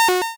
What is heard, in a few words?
pickup enemy; Fruit Hunter